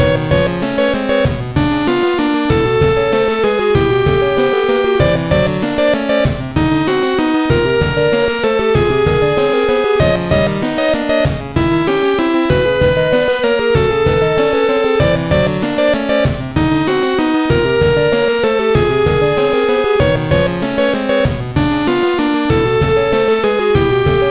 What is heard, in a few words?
Swarm of insects; 牙と鋏